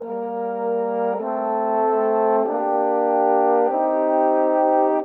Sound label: Horns, Orchestral; Sonic Foundry (Sony Creative Software) - Orchestral VOL-2; Modern 36